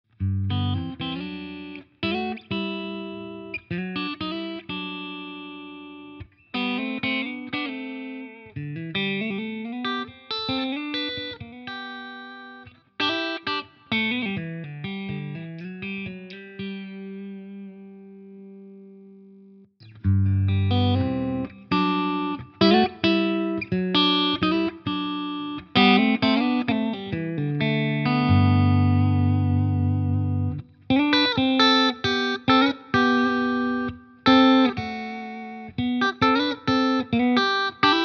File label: VS Audio Aftermath clean Boost